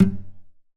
Classical Guitar Damped Pluck

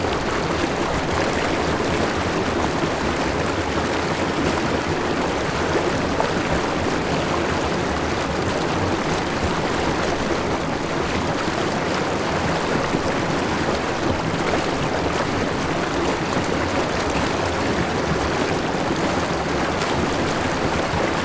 River, Static Microphone

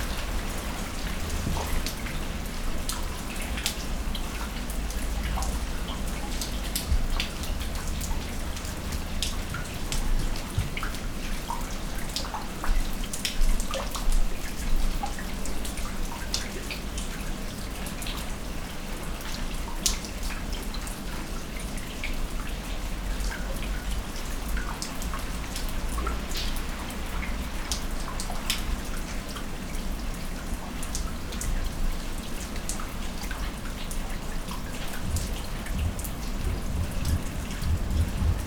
Rain

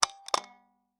Taxi Meter

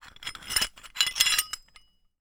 Hole Saw Rattle, Hole Saw Bits

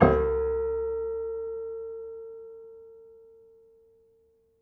Piano Harmonics, Harm Low Mid

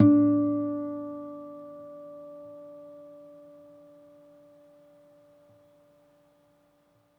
Harm, Classical Guitar

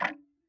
Tick, Clocks Kitchen Clock

